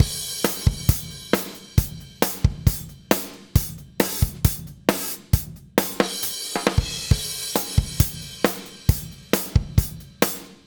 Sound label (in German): Kompressor Vergleich Aixdsp Intuition Compressor